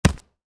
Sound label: Volleyball block medium, Volleyball (Exterior): Block Medium; Sports; Miscellaneous Sports